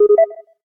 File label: Unlock, phone ui